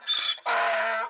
donkey, Nature animals; Alexander; Transcription: Ah

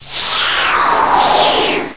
Warp

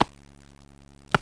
catch, baseball; WAV Sound Effects; sports

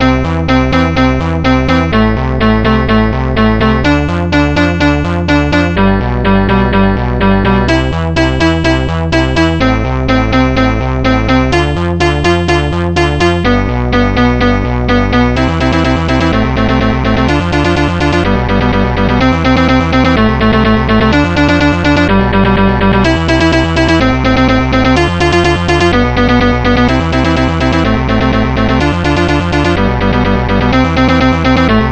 REGGAE; mod.reggae

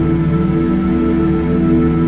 ahh

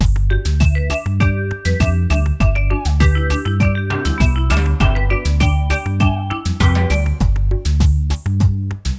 Trinidad; MOBICLIC; fete

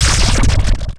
Exclamation; BroodWar

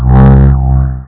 zap; AirTaxi

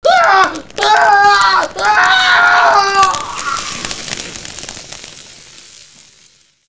flame death, male; actors; Kingpin

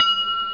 Pick Up Object; Giza

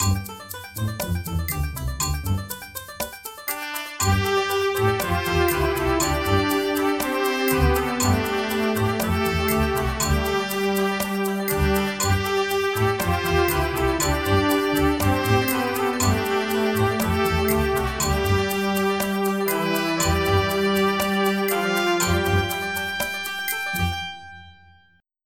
Build; MPG-Net (USA); empire

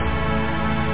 strings; Instruments

